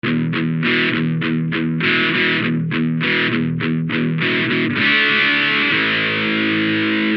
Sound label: El Guitar